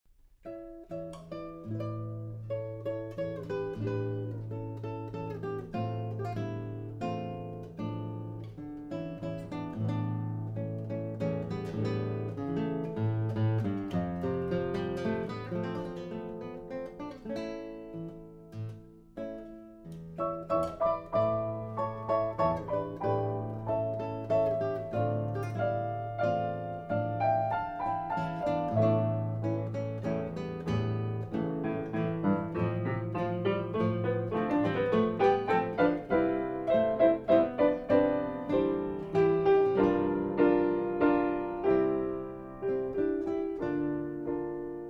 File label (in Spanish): La Guitarra; Romancero Gitano